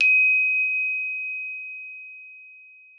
D#5, GLOCKENSP S; Xsample VOL-4

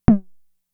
Tom, Toms; Fricke Schlagzwerg; Drum Machines

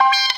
HIT GTR UP; PlatinumPhattCD1; E-MU Producer Series Vol. 8 – Platinum Phatt; Mo Phatt Instrmts

